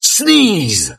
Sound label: sneeze